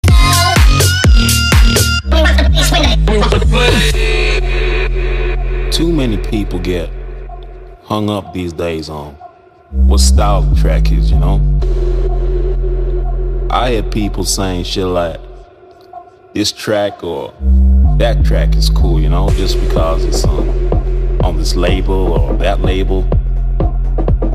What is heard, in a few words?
electro, ringtones